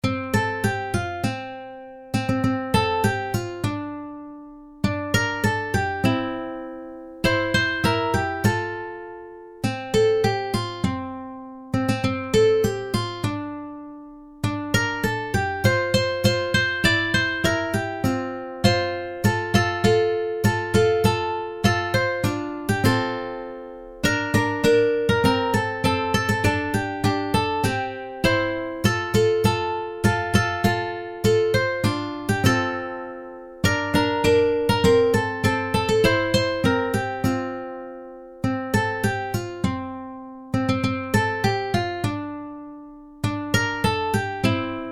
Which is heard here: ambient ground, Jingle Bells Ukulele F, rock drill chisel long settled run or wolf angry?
Jingle Bells Ukulele F